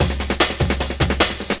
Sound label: LOOP, Drum & Bass, 150 BPM; Ueberschall; Drum & Bass Resonance